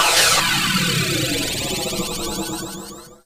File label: Downsweeps